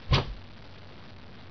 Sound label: catch; the-hat-chooses-the-wizard